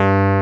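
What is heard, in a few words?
Wurli electric piano; Pro-Rec - Synthsonic; TRINITY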